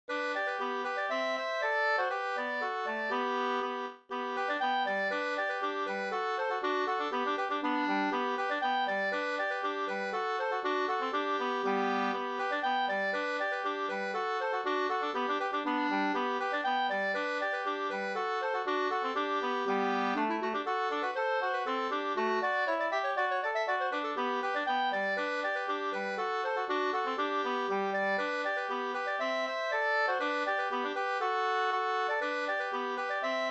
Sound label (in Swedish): folkdans, kadrilj